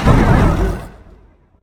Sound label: horse breath; smite; guanyu